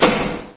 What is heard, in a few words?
throw switch; XDM; buttons